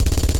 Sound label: minigun, Gunshots; Weapons